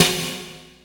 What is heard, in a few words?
snare; Trance Explosion Vol1